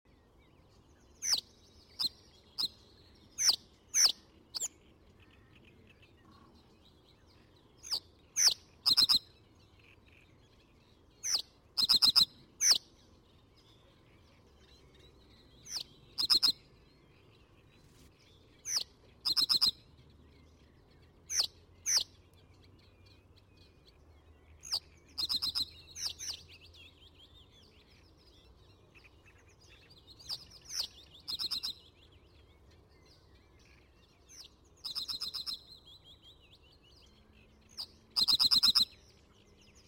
Bruant des roseaux

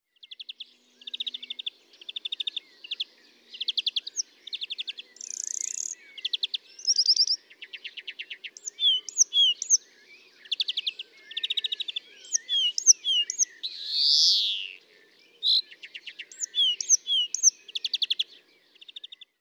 Verdier d'Europe

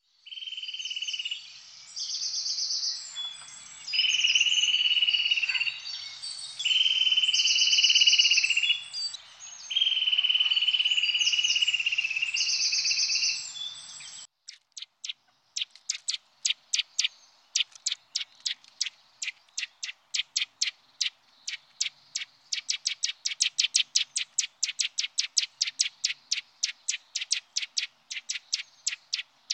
Sittelle torchepot

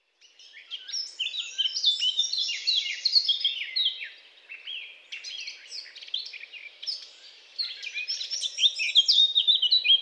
Fauvette a tete noire

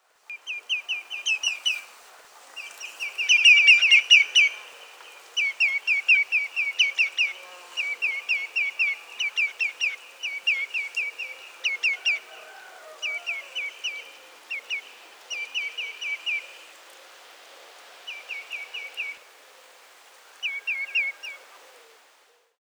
Scolopacidés Tringa nebularia Chevalier aboyeur

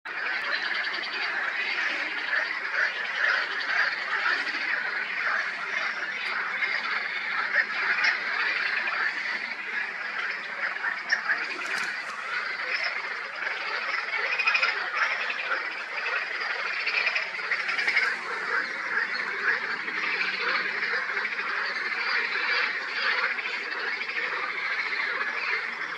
Grenouilles rieuses

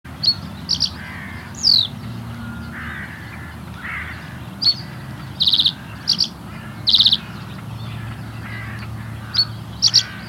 Bruant des roseaux

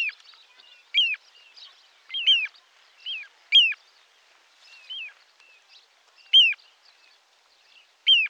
Charadrius dubius Petit gravelot

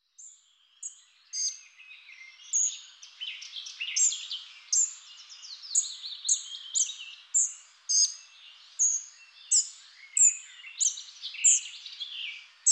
Gobemouche gris; juillet le jardin de la villa sainte anne

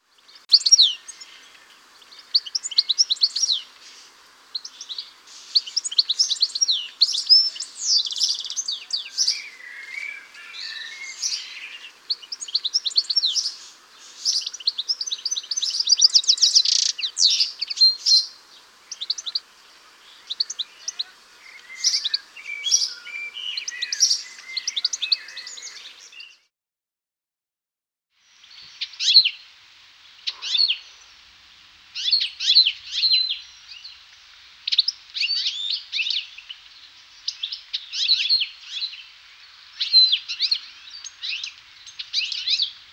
Fringillidés Carduelis carduelis Chardonneret élégant; 101-37-Fringillidés-Carduelis-carduelis-Chardonneret-élégant

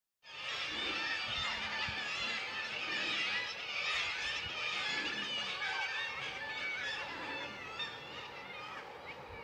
Mouette tri

